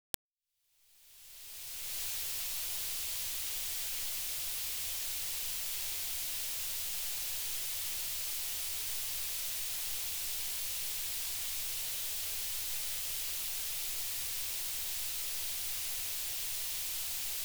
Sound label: short pink noise diff